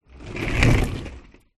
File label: household pull along shopping trolley pass by